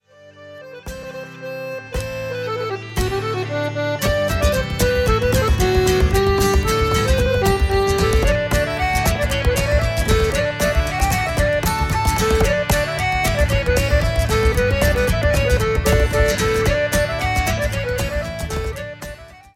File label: Instrumental; Duncan Gray, Corn Rigs